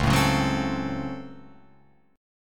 Db Major 7th Suspended 4th Sharp 5th, Guitar, Open F; DbM7sus4#5; strummed; 1,4,1,1,3,4